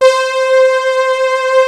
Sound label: Moog synth; OLD SYNTHS; Rubber Chicken Software Sample Bank